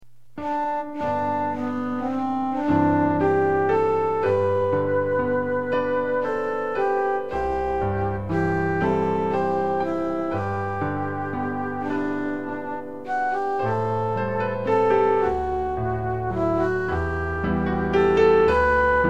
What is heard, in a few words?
In the Garden accompaniment